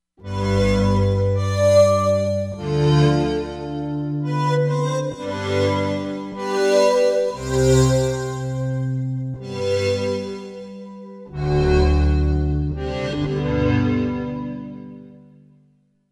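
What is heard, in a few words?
ethno strings, Korg; Korg MSC-13S Ethnic 2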